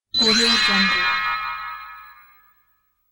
magic zap

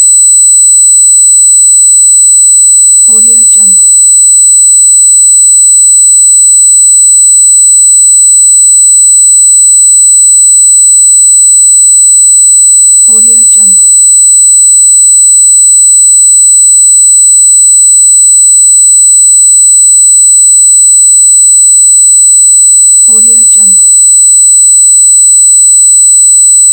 test tone square wave; prosoundfx

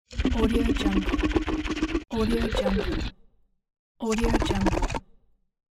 monster gargle

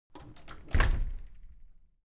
object door close regular handle

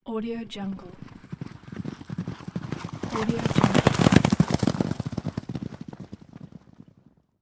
horse galloping

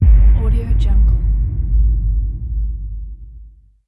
the boom

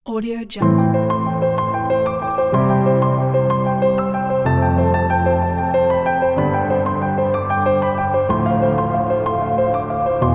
emotional inspiring wedding

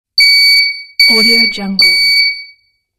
smoke detector alarm

